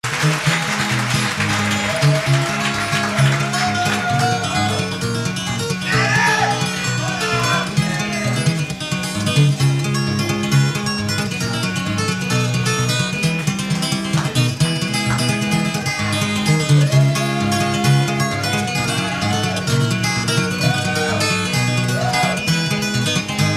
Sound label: shuffle